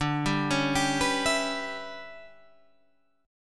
E Minor 6th D, Em6/D chord; arpeggiated; JGuitar

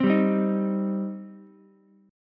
G Suspended 4th Sharp 5th, Ukulele chord; Gsus4#5 strummed; JGuitar

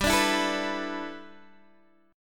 G 6th Add 9th G, Guitar DADGAD

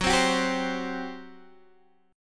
G Major 7th Suspended 4th, Gb

